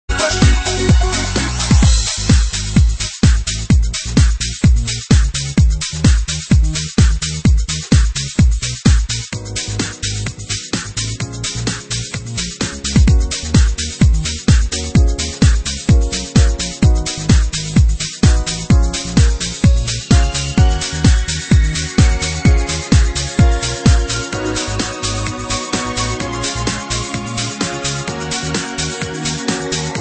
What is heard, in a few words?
Laugh